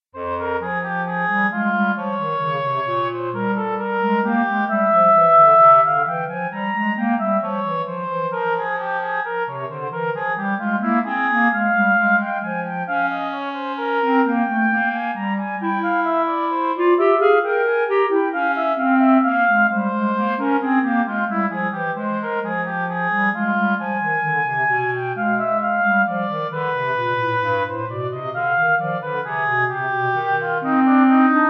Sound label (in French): bois; bach johann sebastian; 15 inventions a 2 voix n 12